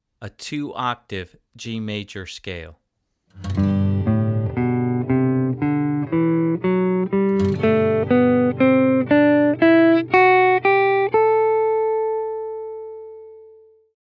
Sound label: G Major Scale